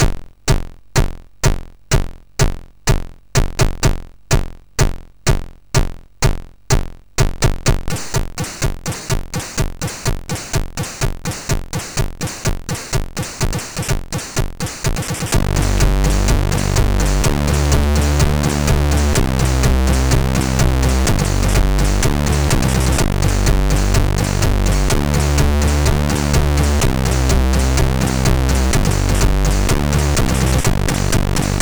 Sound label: Death Metal; sid; MOS6581R4; hvsc